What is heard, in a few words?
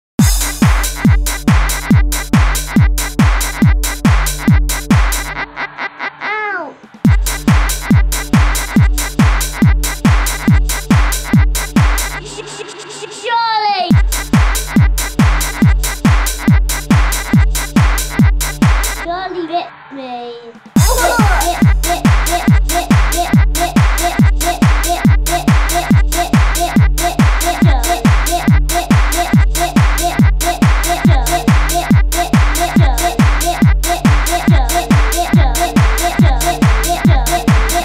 Techno Remix; Charlie Bit Me